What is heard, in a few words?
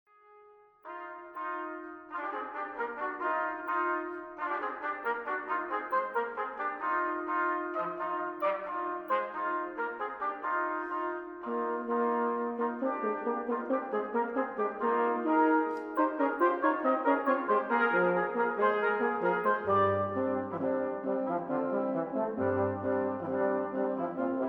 Toccata Brass Quintet